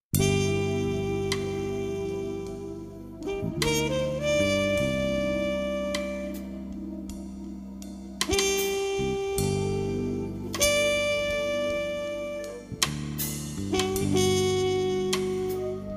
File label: Blues